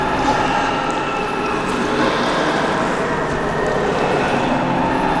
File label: underground wind lp, ambient; Garry's Mod; tf2